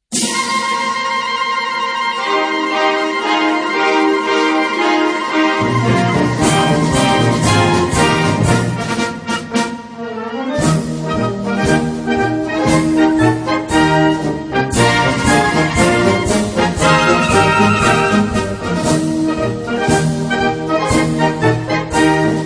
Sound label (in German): Euro Marsch